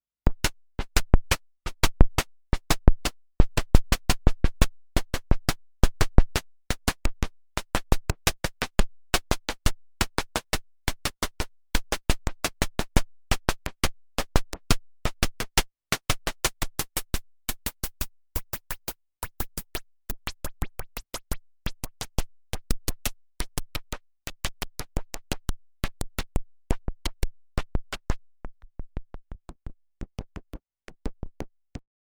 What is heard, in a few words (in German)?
Perkussions